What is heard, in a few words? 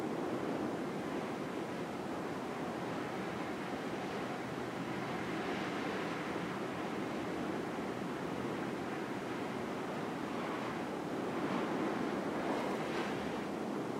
Ambi Wind; Granblue Fantasy; Android